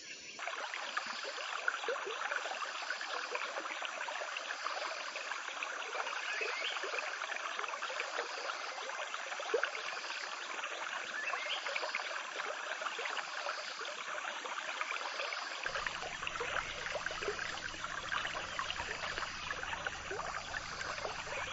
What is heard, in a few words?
stream; mae malai